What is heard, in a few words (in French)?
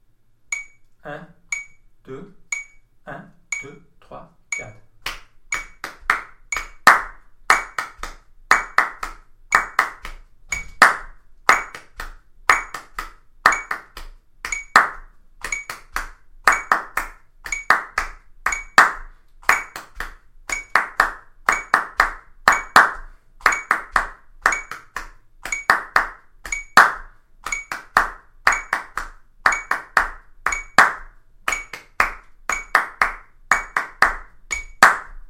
rythme frappe; moses